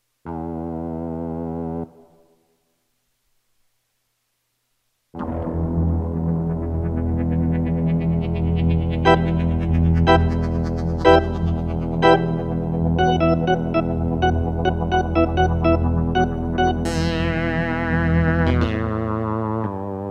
Instrumental

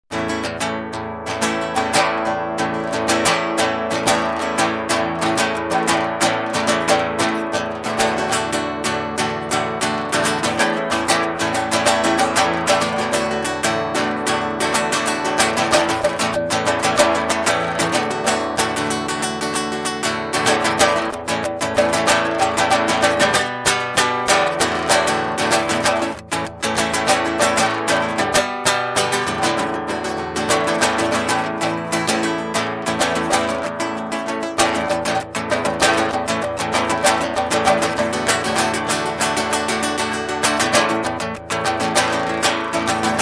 Instrumental

